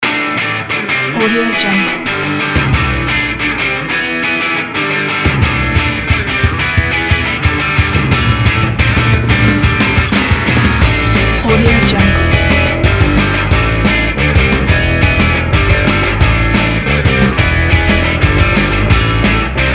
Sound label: fresh energy indie rock